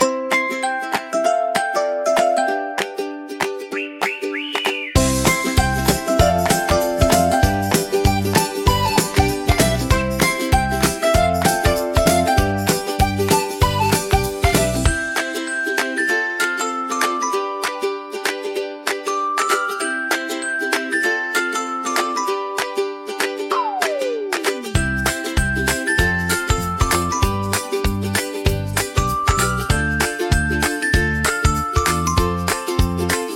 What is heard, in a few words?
sunshine friends a happy kids tune; kids-and-fun